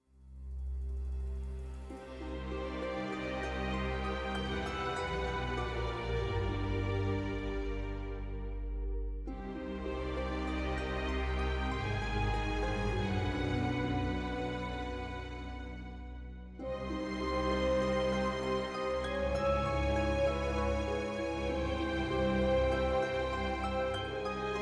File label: Event Sad, music